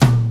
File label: Ron Tom; Proteus 2000; Digital Sound Factory Proteus 2000